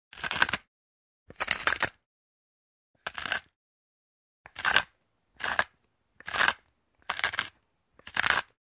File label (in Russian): melnicy dlja soli